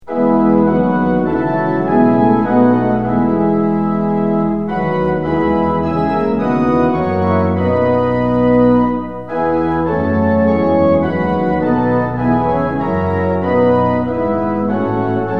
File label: tune; hymns; lillenas